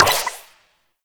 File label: Peely Pix Sticker; Warframe; Nineteeen Ninety Nine; Deep Archimedia